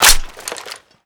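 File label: weapon foley scar reload chamber; Call of Duty Modern Warfare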